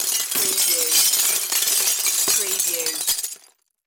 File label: Debris Glass